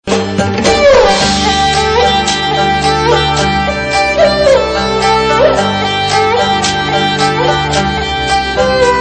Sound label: Folk Music Msg